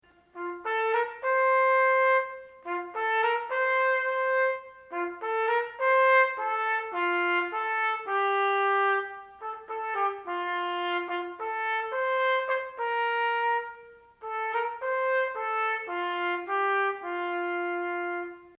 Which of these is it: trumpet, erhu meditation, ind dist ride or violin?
trumpet